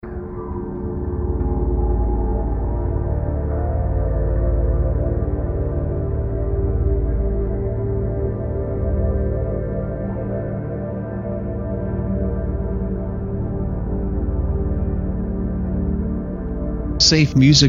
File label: Dark Horror Ambiance Loop; SafeMusicList